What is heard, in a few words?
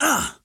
Recoil